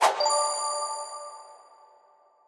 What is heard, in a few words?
total Win Update; berry burst